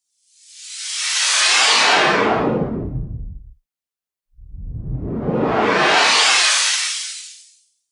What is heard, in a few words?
Stereo Noise Experiment